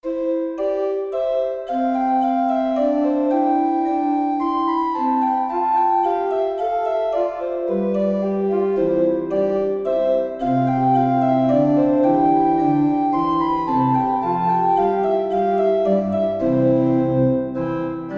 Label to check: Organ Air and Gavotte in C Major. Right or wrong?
Right